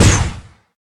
lasergun fire, weapons

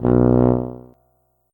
tuba note

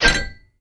armor

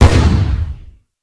grenade fire, weapons

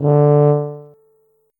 tuba note

